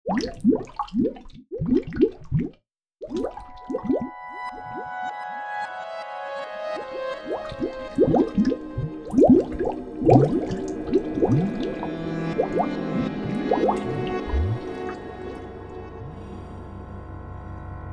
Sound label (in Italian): musica; ospiti